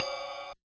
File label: Kit Ride, Realistic Concertmate-670; One Shot Kit